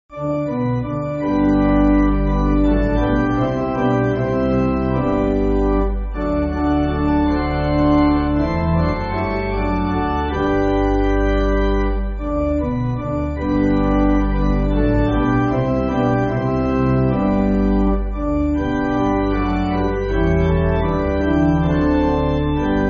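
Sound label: Pipe Organ, Free Music Backing Files for Churches; O Sinners Think; Sankey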